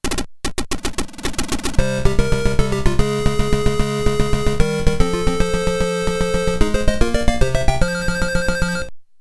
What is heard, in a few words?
Victory Fanfare; Penguin Adventure OST; Audio FlashJacks